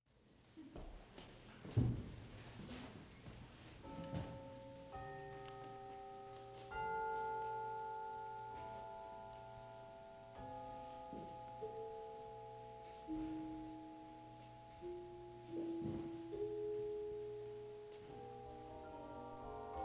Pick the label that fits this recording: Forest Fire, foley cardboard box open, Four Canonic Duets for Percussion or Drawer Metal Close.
Four Canonic Duets for Percussion